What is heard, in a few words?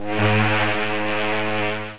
ship horn; Master Clips Email Animator